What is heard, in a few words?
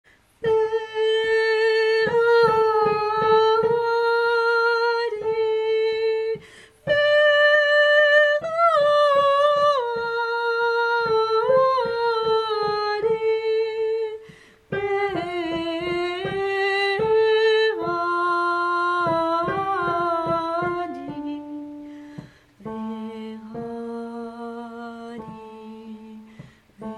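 soprano, chants; SATB; Vehadi; Peter Deunov; concert du 15 août 2009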